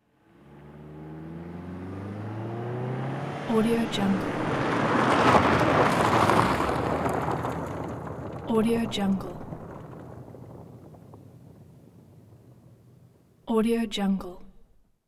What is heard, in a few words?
auto pavement to gravel transition